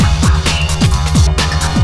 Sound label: wah